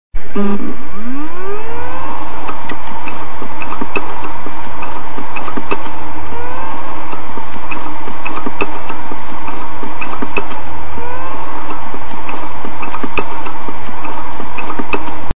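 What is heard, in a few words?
wd laptop seeks clicks, hard disk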